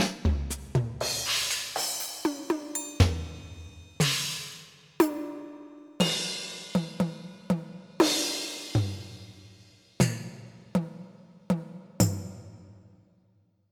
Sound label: Pop Drums Soak